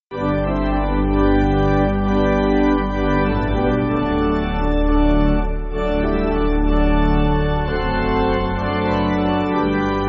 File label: Pipe